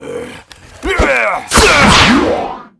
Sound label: Ice Lich Atk